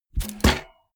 bmx bike bar spin heavy

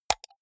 computer mouse right click hard

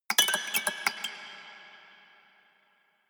haunted strings tinkle sequence fast short hard

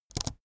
keyboard laptop space press fast soft

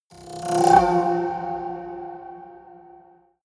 haunted strings roll high

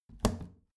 plastic trash can close regular muted

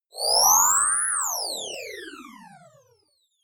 whoosh sci fi sweep

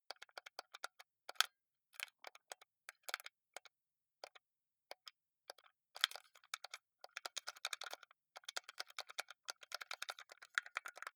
console controller xbox play sequence lively

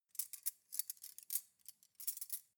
cutlery cake forks handle

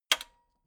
metal button radio spring high

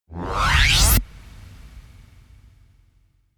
sweep

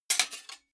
sword sabre metal block

